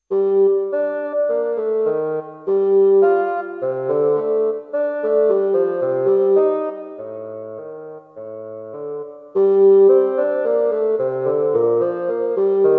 bass solo

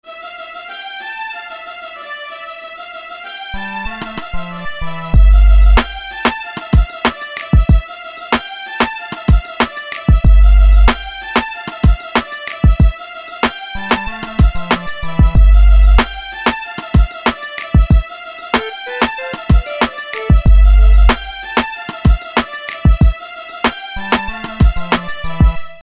beat

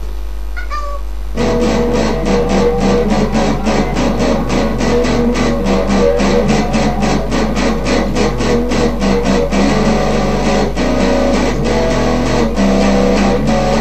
guitar

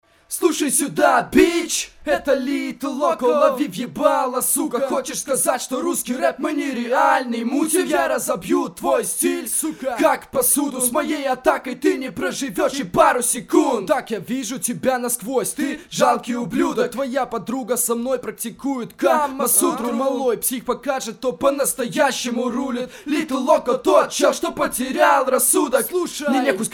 acapella

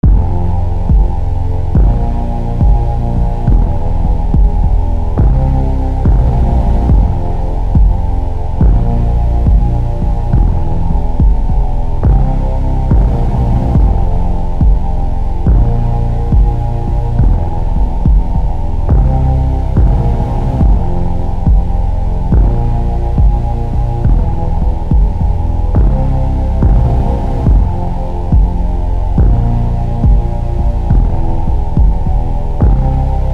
instrumental

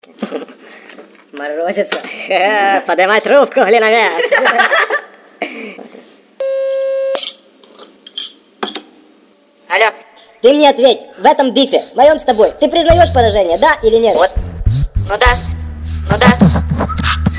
beatbox

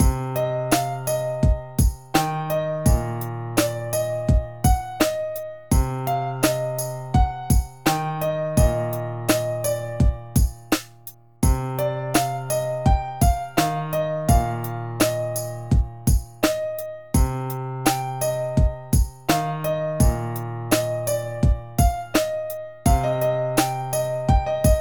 piano emotionality

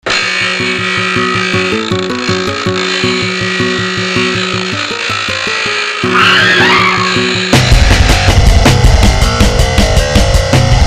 instrumental

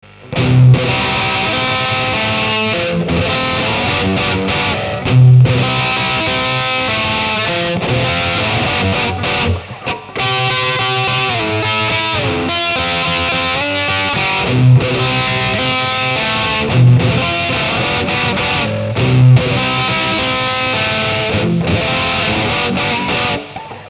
guitar overdriven

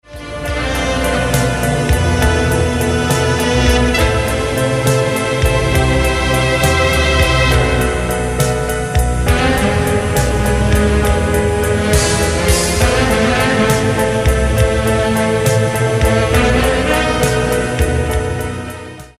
sotto voce blues

